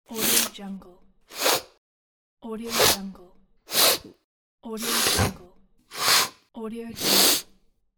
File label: sniffing